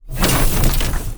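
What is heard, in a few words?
enemy attack mecha smash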